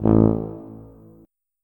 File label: tuba note